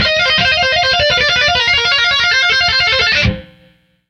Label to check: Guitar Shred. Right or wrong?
Right